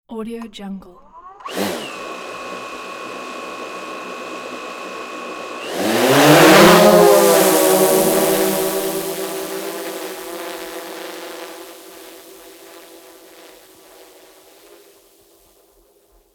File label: drone take off